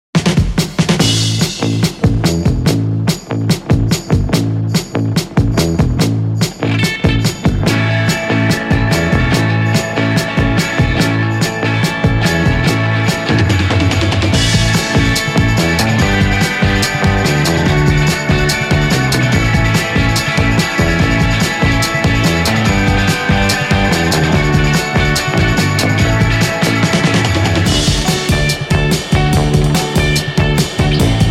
Garage Soul

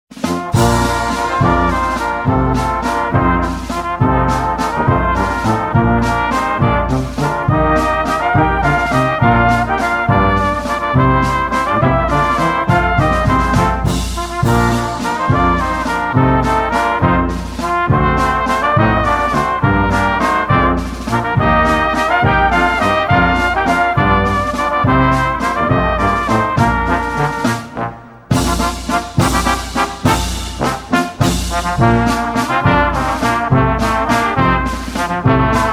British Tradition

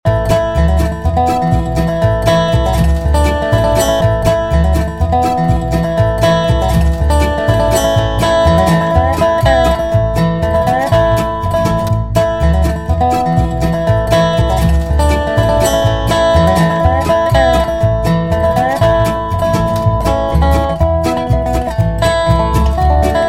Bluegrass; music on hold samples

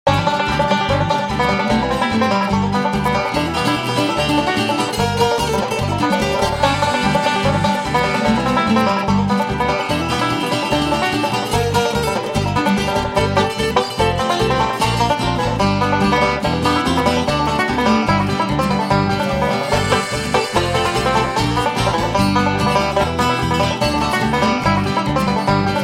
Fingerpickin; music on hold samples

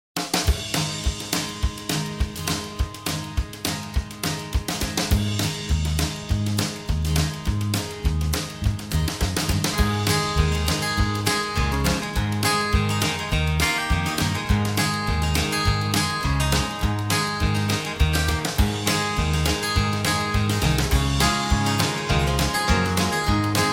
Chicken Pickin

